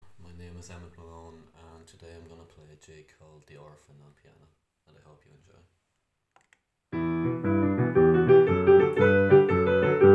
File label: a jig on piano; The Orphan